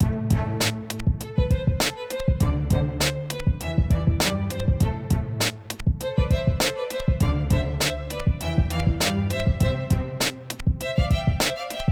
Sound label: 100bpm light staccato cello underscore